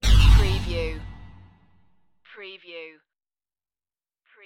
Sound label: hollow space shot